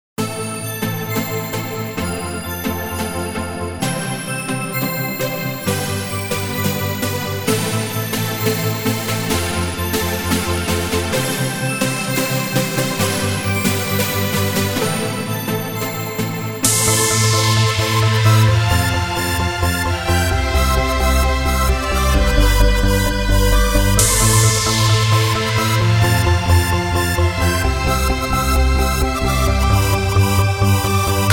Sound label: dance; מקצבים שבניתי על קורג pa700